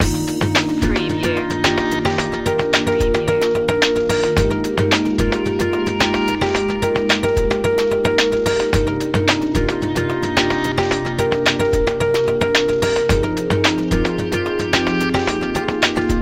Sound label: bright and corporate loop